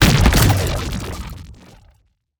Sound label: Sci-Fi Energy Explosion